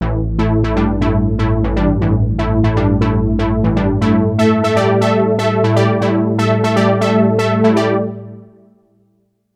poly lead; novation peak test

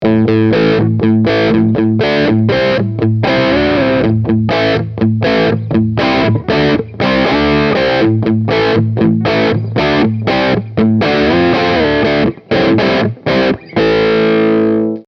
ibanez premium 721fm-bif riff neck bridge pu

